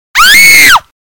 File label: SCREAM